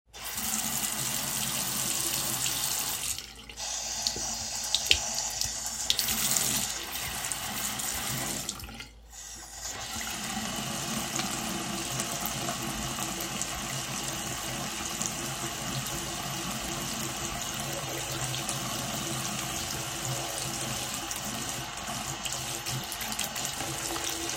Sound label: Sink